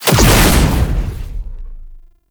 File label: Summon Clone; Warframe; Uriel; Demonium